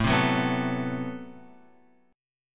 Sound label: A Minor 6th Add 9th A, Chord; Am6add9 Chord {5 3 4 4 X 5} strummed